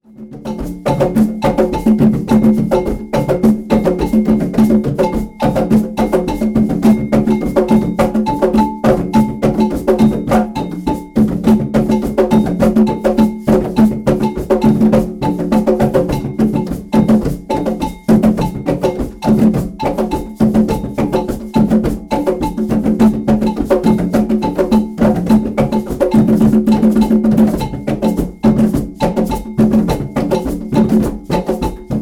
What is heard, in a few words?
kpanlogo, drums